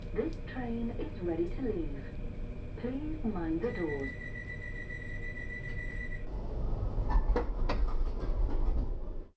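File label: Door close Left, Train; LT1972 MkII; OpenBVE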